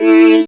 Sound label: syn, Dance Synths, Trancers; Trancers 1